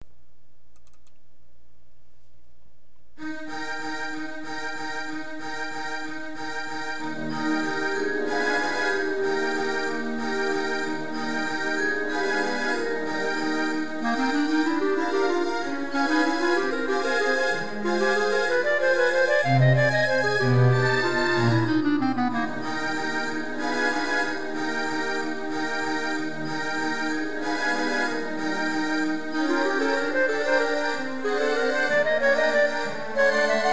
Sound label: Waltz Flowers accomp; Grade 10 Class Exam Solos